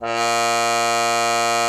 cup mute A#2; Xsample VOL-3

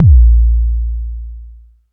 m base, Jomox Mbase01

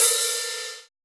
OHH, HI-HATS; EXPRES

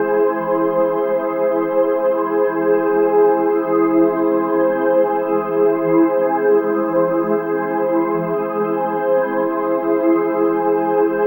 Suspense Chord; Sonic Foundry (Sony Creative Software); Numina II

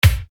Bass Dr, EuroDance Bdrums

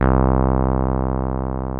TB-303

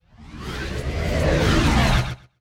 Reverse Fx; musicradar

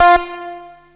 bleep

